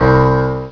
Piano

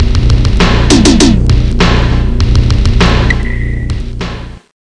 End of Level Tune; Asterax

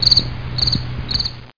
Night; Mosaic Magic; Mosaic Sounds

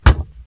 bod strk, bugs; Starship Troopers

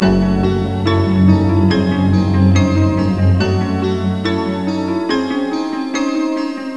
Suspense; Prelude; Option Control

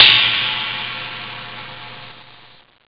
ride, drums; OctaMED SoundStudio V1

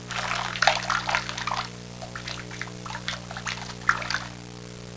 running water; Sound Effects for Multimedia - Volume 1

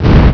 explo; Zooom; Arcade

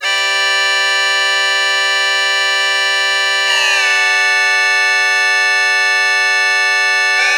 Synt; SYNTH; 130 BPM

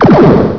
Super Torp; Missions of the Reliant II

